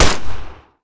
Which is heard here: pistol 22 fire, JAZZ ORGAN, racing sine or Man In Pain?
pistol 22 fire